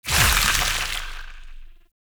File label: Void Release; Duviri; Incarnon Adapters; Weapons